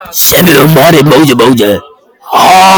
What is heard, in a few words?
augh